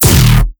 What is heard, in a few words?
Kick Dist; Boss